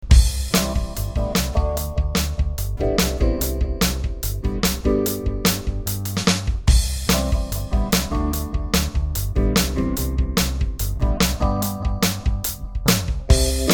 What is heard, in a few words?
bounce loop